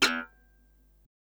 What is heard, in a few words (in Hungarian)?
crash rövid, guitar